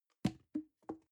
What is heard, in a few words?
plastic can small fall bounce soft hollow